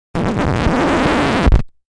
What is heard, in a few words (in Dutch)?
naald over plaat krassen